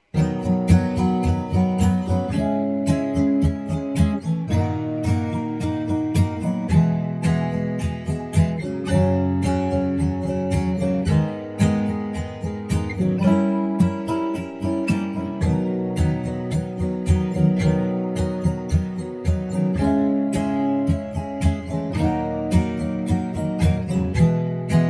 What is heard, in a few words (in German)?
B H Dur Akkorde